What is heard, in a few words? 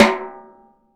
SONOR, SONOR SNARE